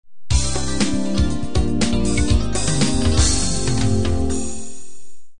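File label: standard 16 beat intro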